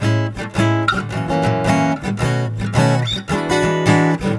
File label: Loop Guit; carte blanche for a fistful of teeth; windows gamerip 2006